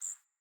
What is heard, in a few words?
Bird Single Chirp; Paragon Bird Guide